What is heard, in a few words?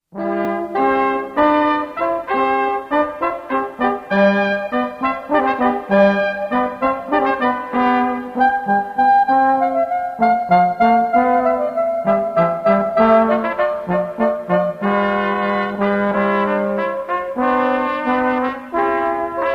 Brass Handel